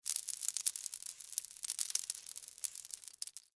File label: Impact Debris Small; Materials; SFX Bible